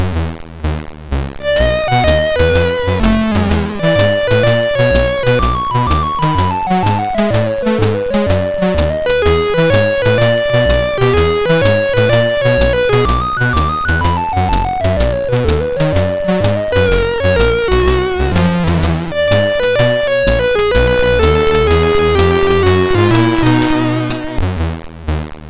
MOS6581R4, sid

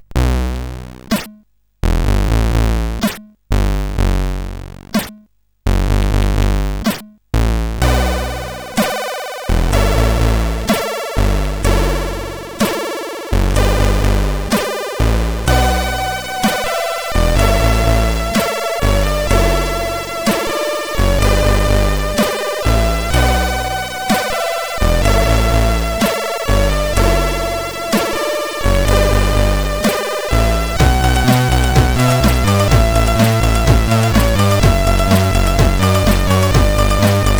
Short Tune, CSG8580R5; sid

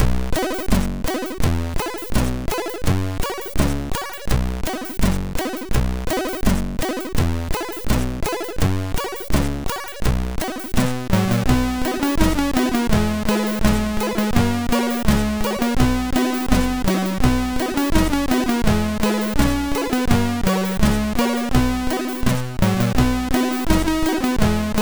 sid MOS6581R3; Willi 04

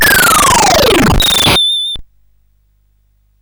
sid CSG8580R5

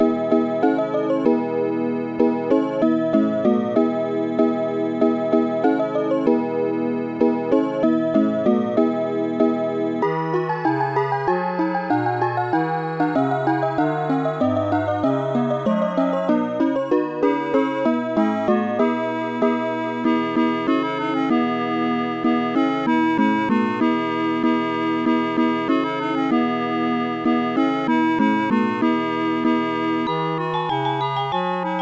ding dong; Sonix Music Driver

